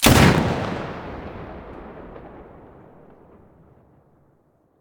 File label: muzzle spas12 mono, weapons